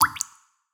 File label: Drop, notifications